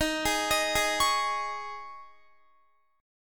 Eb 7th Suspended 4th, Guitar; Eb7sus4; arpeggiated

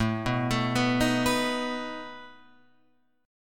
A Suspended 2nd Flat 5th A down Guitar Open F; Asus2b5 arpeggiated

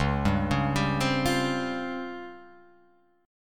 C sharp Major 7th Flat 5th, chord

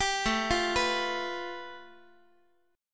A 7th Suspended 2nd Sharp 5th, Guitar; A7sus2#5 arpeggiated; JGuitar

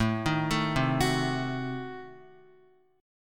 A Diminished 7th A down Guitar

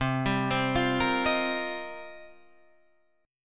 C Minor 11th C Guitar Standard; arpeggiated; JGuitar

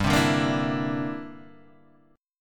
G 6th Suspended 4th Sharp 9th, guitar chord; G6sus4#9 strummed; JGuitar

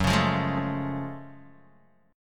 Gb Minor Major 9th Gb, down, Guitar, Open D

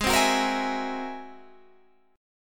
G sharp Augmented 9th G sharp

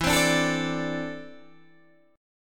E 7th Suspended 4th Sharp 5th, Guitar, DADGAD

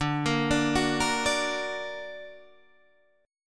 D Augmented Guitar Open D, chord

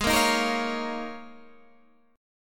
Eb 7th Suspended 2nd Suspended 4th Ab

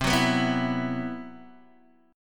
C Major 7th Flat 5th C, Guitar DADGAD

